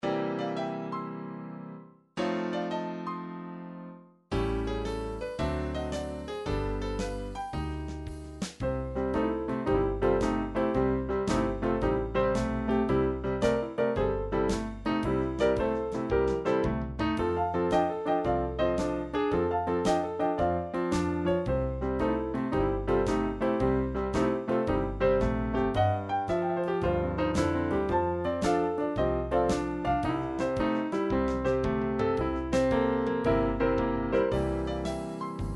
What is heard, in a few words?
rainy night blues, midi